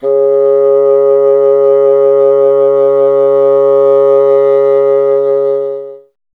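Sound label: wind bassoon C; Miroslav Vitous - Solo Instruments VOL-1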